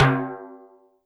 Timbale L, YAMAHA RM1X

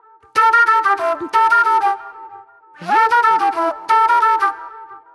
Sharp Delay Flute

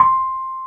AMB ROAD, Keyboards Old School; USB Soundscan vol.09; AKAI

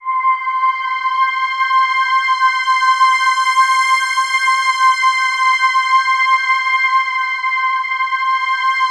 C6, Synth Leads

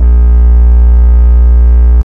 TRAXBASS, Hard & Loud Techno; USB Soundscan vol.01; AKAI

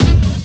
Kick Drum, Drum One Shots; Sonic Foundry (Sony Creative Software); Ambient Grooves; Kicks

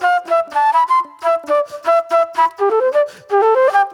Movie Flute

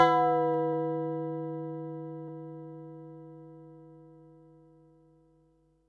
HH, Pearl SC-40 Hihats and cymbals

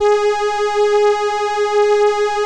ELKA, String Machines; ELKA RHAP

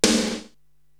SD Processed, Korg TR-Rack drum machine

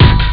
bdrum; thepower; Amiga Soundtracker Sample Packs

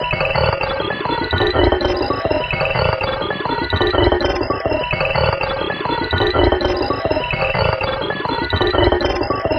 Electrolyte, Tonal Atmospheres; Sonic Foundry (Sony Creative Software); Myths of Technology

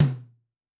Amb Tom L, Yamaha DTXpress-II

